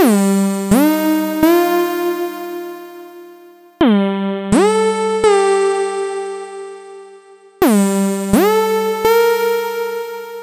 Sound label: 126 Gm glide lead synth, synths and melodic loops